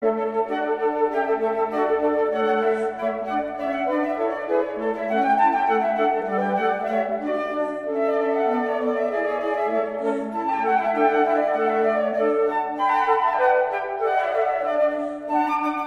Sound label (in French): Suite op.71a du ballet; P.I. Tchaikovski; Casse-Noisette - Danse arabe; La Flute Enchantee